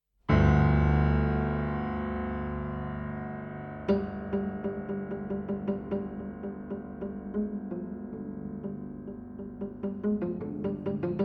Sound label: Flamenco Prelude